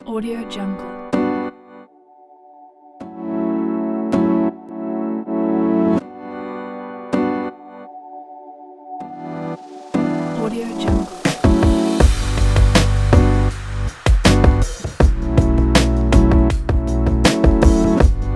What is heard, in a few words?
chill hip hop; audiocenter